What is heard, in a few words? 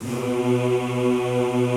B1 L, Classical Choir; Best Service; Extended Classical Choir